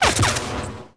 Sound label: lightsaber hit; weapons